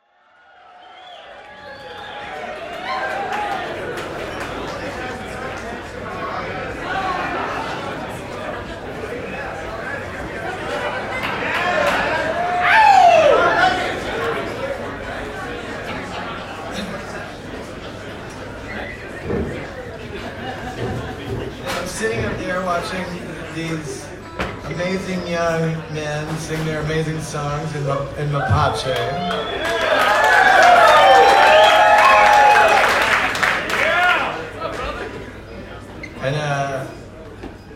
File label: intro applause, Solo Acoustic Show